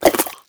breath in, headcrab black; creatures

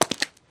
footstep concrete

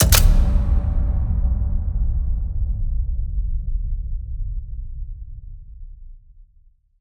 mainmenu press play; Counter Strike Model Pack; CS:GO; panorama

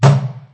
plastic barrel impact hard; physics

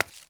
fruit impact; physics

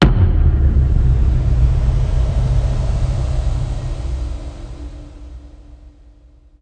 team intro, ui

